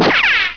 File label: ricochet; firearms